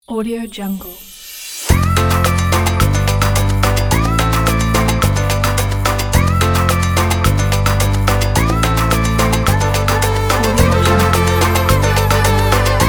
electronic summer latin dance

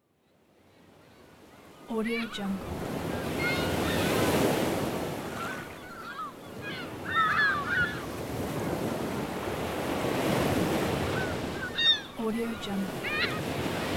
seagulls ocean waves; wavbrulee

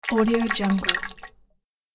water fast little bubbles

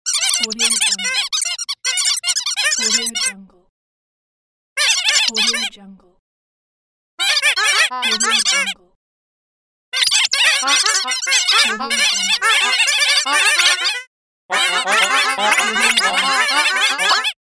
cartoon birds chickens ducks fussing

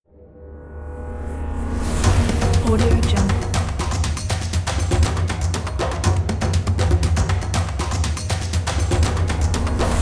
cinematic action drums

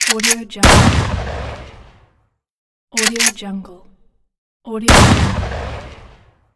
shotgun

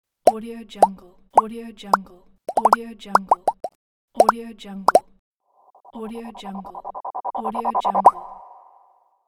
pops

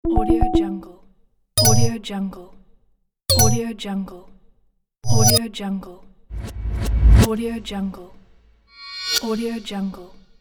game pack

